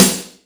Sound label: SNARE, M1-M3R; Greytsounds VOL-1